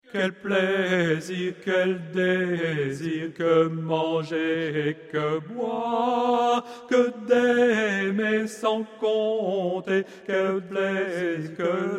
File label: Tenor; les 4 voix ensemble